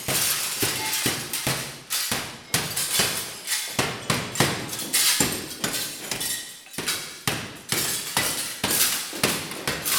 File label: glass breaking